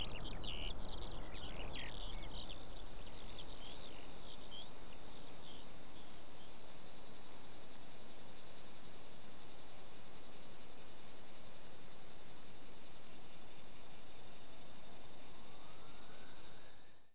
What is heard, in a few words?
BUG